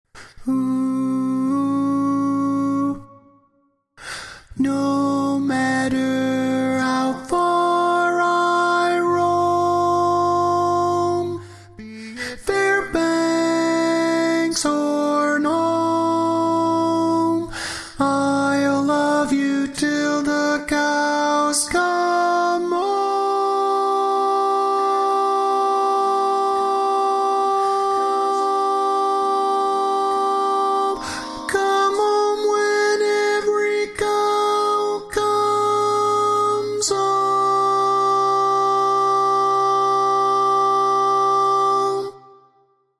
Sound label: Tenor, barbershop